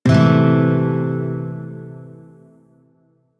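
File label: Shawzin Pentatonic Major Chord; Music; DE; Warframe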